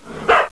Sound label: dog bark